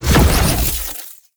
Rifle Alt Shot, Weapons; Warframe; Tenno; Gyre

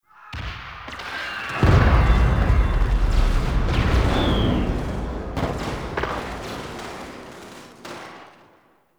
Ambient Battle Distant; Warframe; Tau

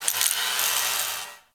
Rolo Dex Swipe; Ambience; Props; Corpus Ship

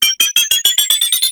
Suicide Scuttler Pre Death Beep; Enemies; Gameplay; Boss; Warframe; Oraxia

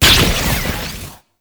Explosive Impact; Warframe; Weapons; Akarius Prime

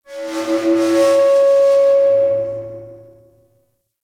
Settlement Metal Screech; Warframe; Grineer Settlement

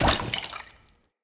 Walk Water, Footsteps; Enemy; Characters; Lady Deora

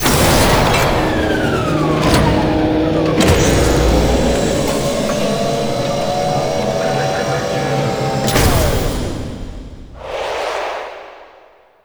Egg Activate Stinger; World Seeder Descent

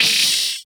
Amalgam Moa Vocal Pain

